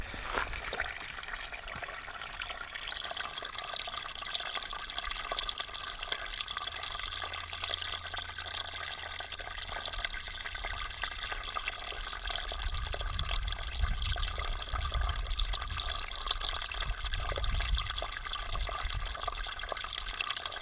potok v gryazi

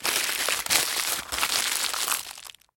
nesderzhannoe bistroe otkrivanie bolshoj shokoladki

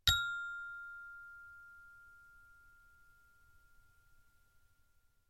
zvonok visokij f

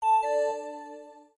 zvuk windows 2000 uvedomlenie notify

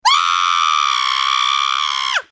korona krichit

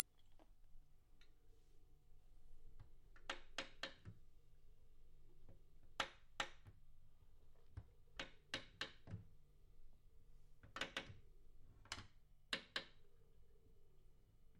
dver tihonko skripit gluho

